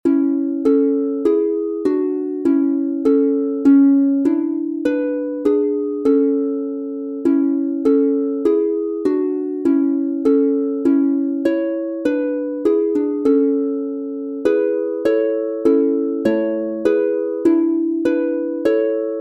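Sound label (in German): A Dur 3 stimmig instrumental, Harfe; Gegruesst sei Maria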